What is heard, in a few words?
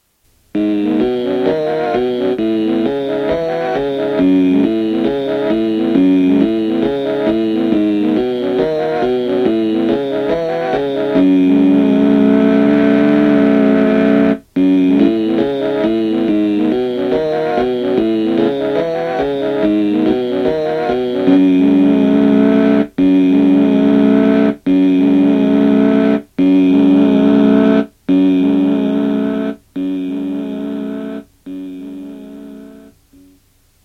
fuzz